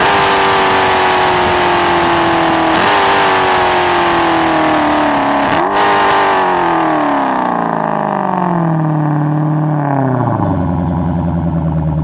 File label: throttle off, vehicles